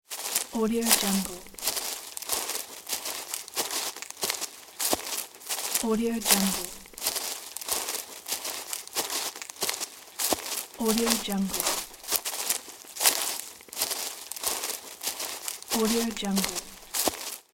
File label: steps on grass